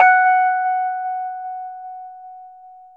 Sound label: Rhodes 73 F#; E-MU Producer Series Vol. 5 – 3-D Audio Collection; 3DRhodes